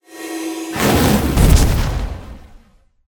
Piercing horns

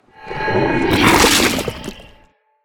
Poison bite

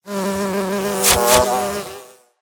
Poison bees

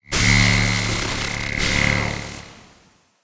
Electrify

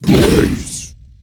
FIRE BULL

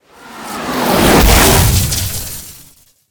Power slap

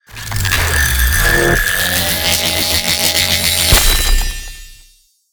Super punch

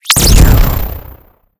Sting

